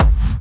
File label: Bassdrums Dirty, Kicks; Vengeance Samples